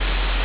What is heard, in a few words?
ambience room tone